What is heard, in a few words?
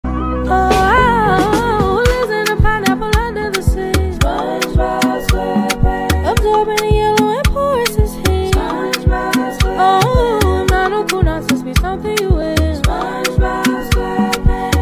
Afrobeat Mashup